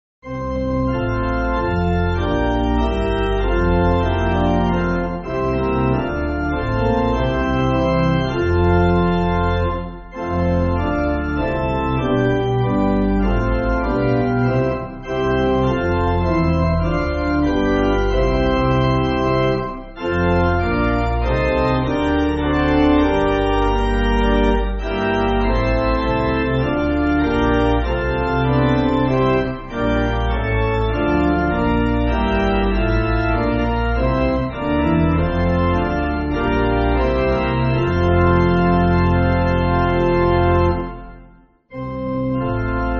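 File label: Pipe Organ, Backing Files for Churches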